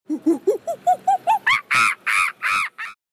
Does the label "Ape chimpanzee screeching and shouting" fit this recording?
Yes